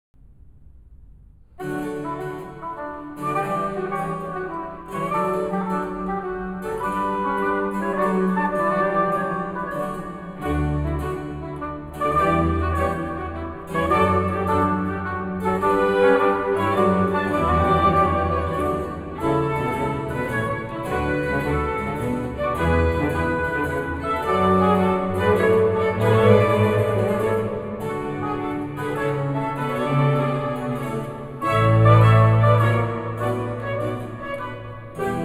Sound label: Concerto No. 5 in B-Flat Major, Larghetto staccato; SF 944; La Cetra